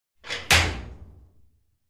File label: Vault Outer Door Lock; safes & vaults